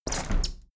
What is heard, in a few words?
window handle plastic squeak close heavy; objekte; fenster